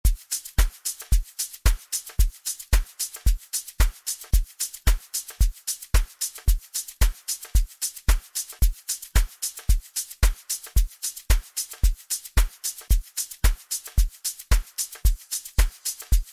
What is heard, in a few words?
Piano Tech